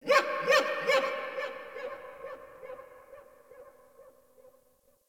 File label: Distant Zone One Creature; Warframe; Ambience; Eidolon Plains; One Offs